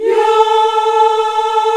VOX African Yas; African Aahs; Roland LCDP11 Africa VOL-1

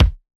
OSH Kick; Big Fish Audio; Old Skool House; OSH Kits; OSH Kicks

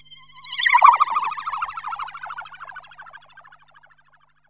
FLYBY, SYN. FLYBYS; Best Service Alpha Dance vol.2

